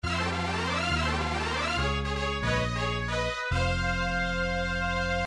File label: victory; nes mega man